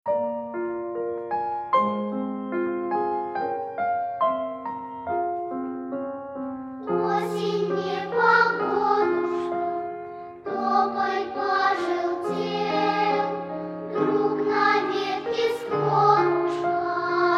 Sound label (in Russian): detskie pesni pro osen